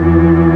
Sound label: Barton organ; Barton Melo L+R